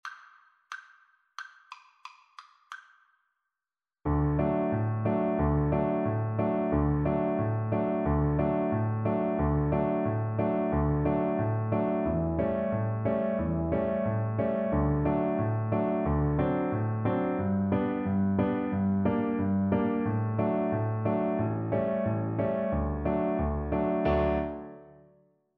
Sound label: when the saints viola